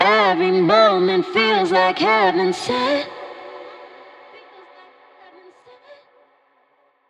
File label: vocal phrase short wet low 92 Amaj; scent